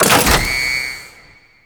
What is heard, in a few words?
Asteroid Field Console Activate; The War Within; Ship